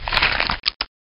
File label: shred; blood fresh supply